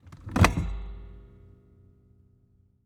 Transportation Seat Full Size Van Seat Front Passenger Seat Adjustments Forward